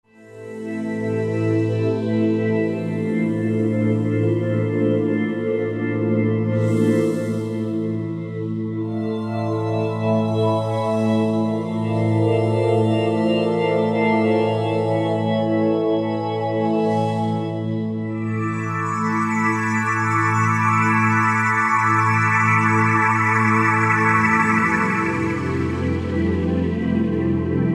Glassy Pad; Roland JV-2080

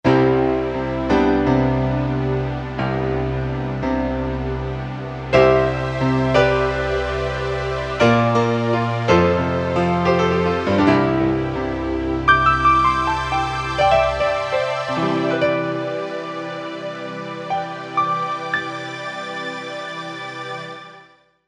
Piano Strings

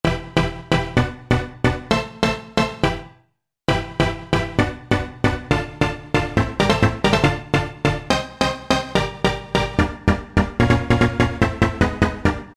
Eurostab; Dance; Roland SR-JV80-06

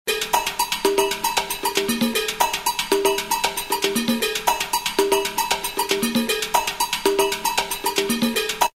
Perc Jam 116; Roland M-DC1

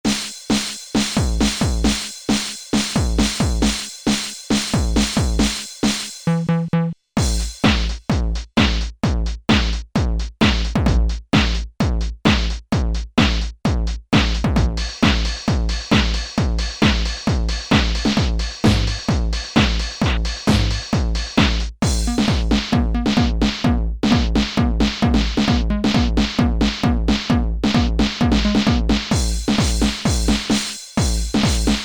Rave Kit, Alesis Z2 EuroDance

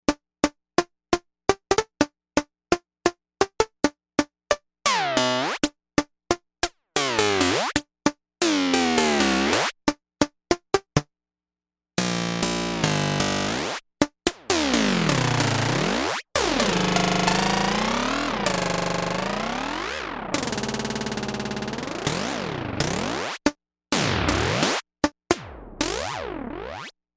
Mod Twanger; Factory Patches

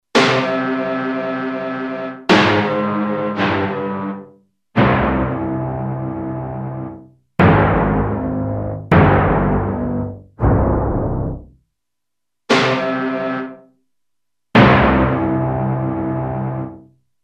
Hit

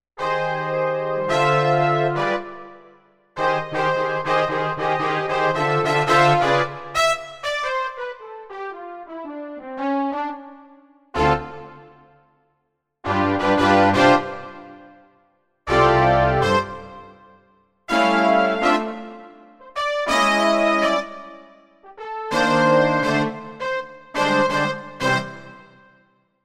Warm Brass

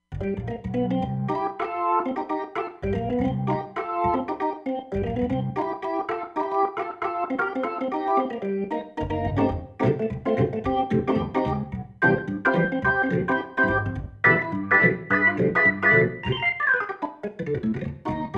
Organ, Roland Fantom XR; Zepix Organ

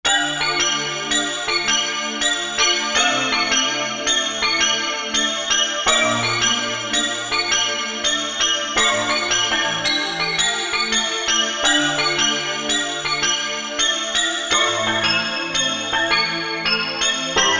Space Bell

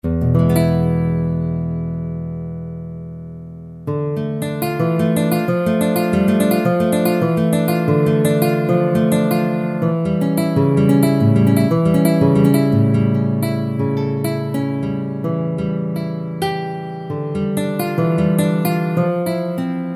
Nylon Guitar, Kurzweil K2000; GUITARS; Kurzweil K2000 Value Pack; Extra Programs

